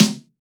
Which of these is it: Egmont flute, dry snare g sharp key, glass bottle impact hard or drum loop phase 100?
dry snare g sharp key